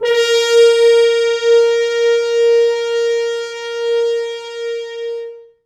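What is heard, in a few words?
French Horns stops, A#3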